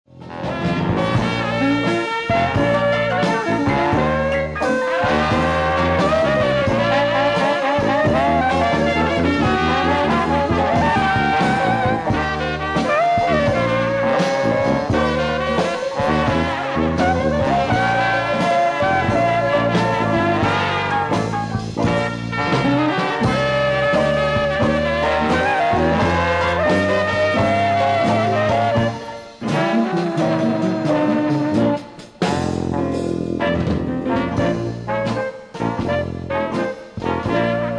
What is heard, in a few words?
tin foof blues